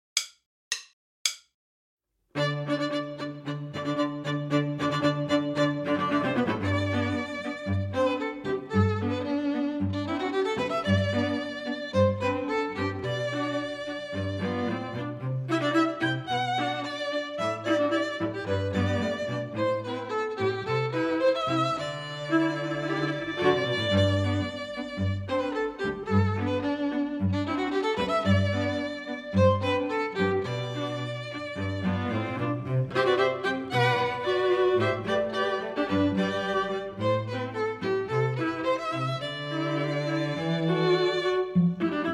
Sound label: minus Violin II